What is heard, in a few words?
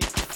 Hi Hat sample